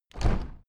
window handle open hard; objekte; fenster

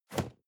cardboard box medium place slide; objekte

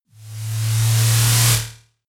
noise sizzle short; risers

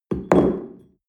espresso upset tablecloth medium; sport-freizeit; restaurant-cafe

